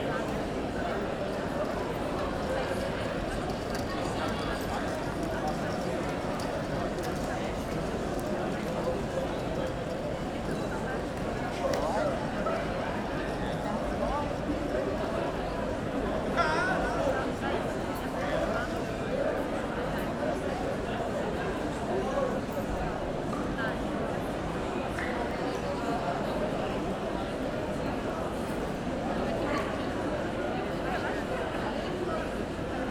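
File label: Restaurant Crowd Chattering Exterior Pedestrian Zone; Justsoundeffects; Urban Ambiences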